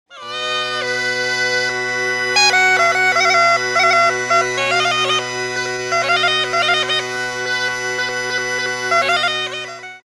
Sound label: aerophone